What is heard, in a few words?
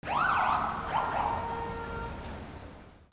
AMBIENCE